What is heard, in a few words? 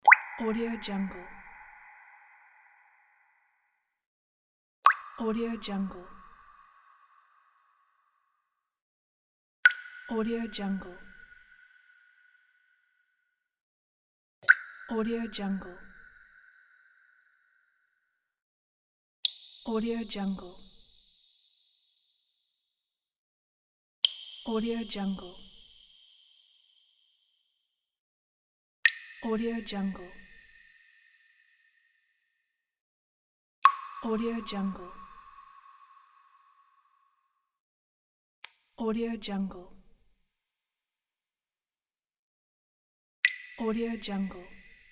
drop pack